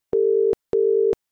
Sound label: ringback tone uk; iOS System Sounds